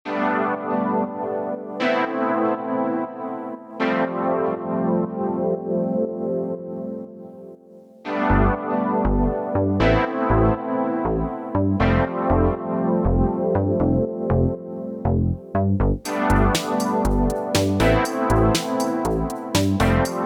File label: Retro Synth